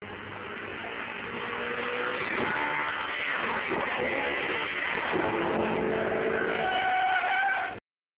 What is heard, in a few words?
engine